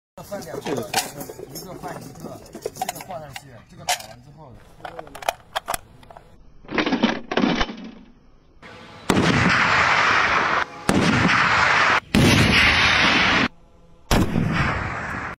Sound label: sniper